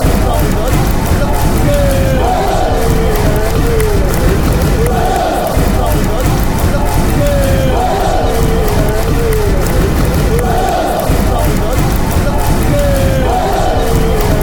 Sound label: wigan clap